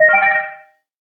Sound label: Cobalt, notifications